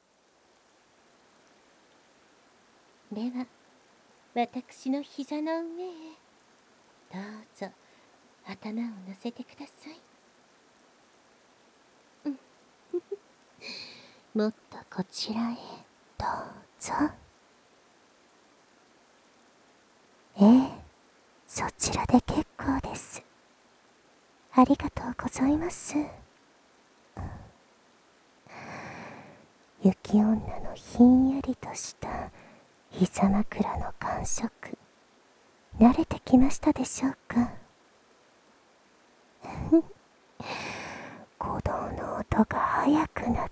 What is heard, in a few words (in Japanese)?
火照った耳を覚ますための冷やし吐息（雪環境音あり）, バイノーラルマイク 効果音あり